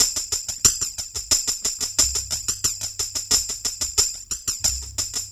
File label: Tamborine, Percussion Loops; Ambient Grooves